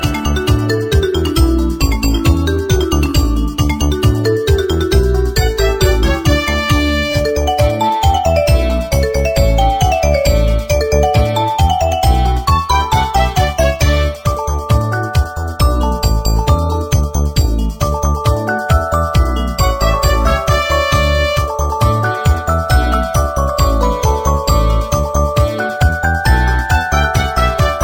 Pretty Bell; Soribada

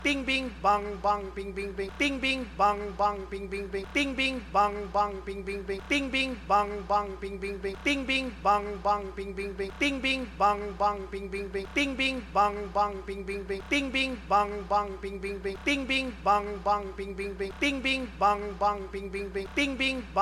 Bing Bing Bong Bong

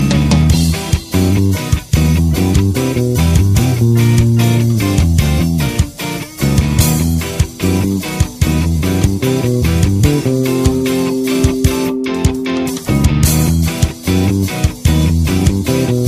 Ska

